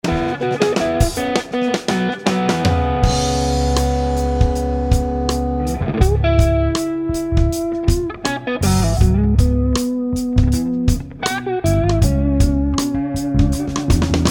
Electric Rock Blues Trio

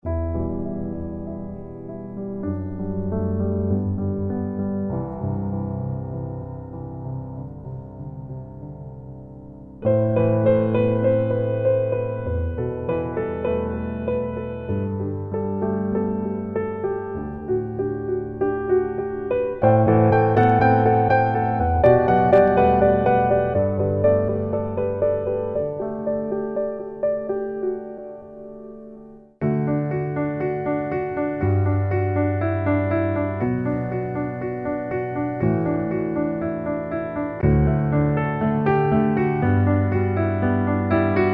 Melodic Keys

